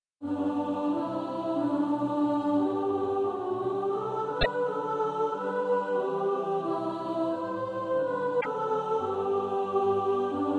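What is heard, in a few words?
soprano, Practise Music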